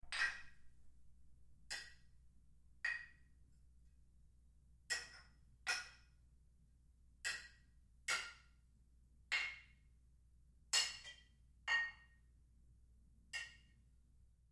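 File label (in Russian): звуки чоканья на празднике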